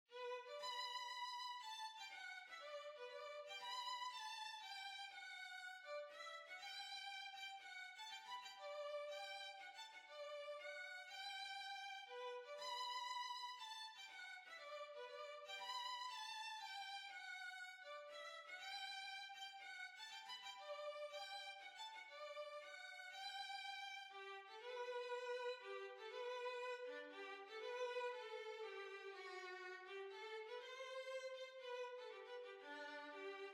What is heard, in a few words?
Violin